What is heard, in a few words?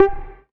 Synth Shot G; Mutekki Media; Vengeance Electroshock Vol.2